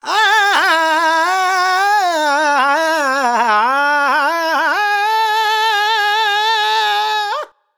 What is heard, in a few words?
Vox, Vocals Male, World Pop